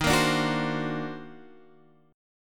D sharp Minor Major 13th D sharp, down, Guitar, Drop D